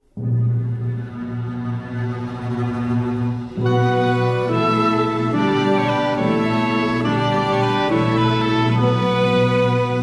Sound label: vocals